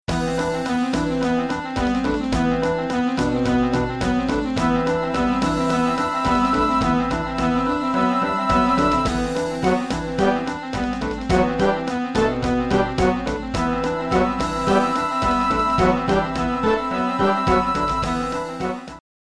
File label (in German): zwischenmusik